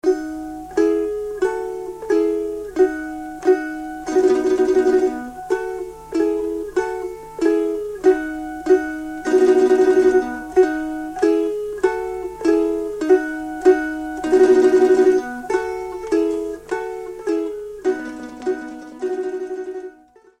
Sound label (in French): Kolo, Croatie